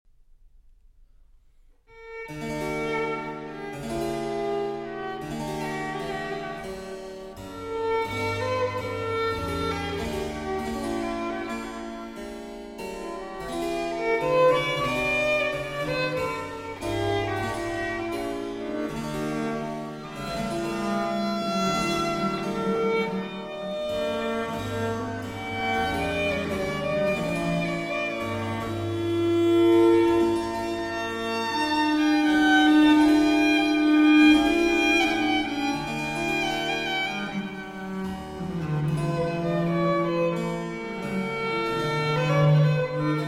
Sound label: Violin Sonata in E Major, Adagio; 12 Violin Sonatas, Livre 2, Sonata 12